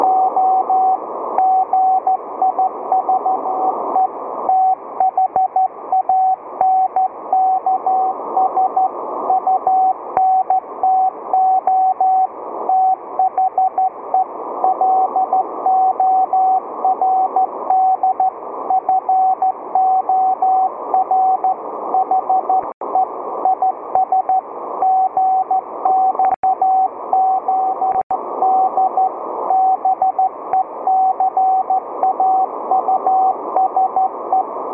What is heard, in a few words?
Psalm 118 CW, Amateur Radio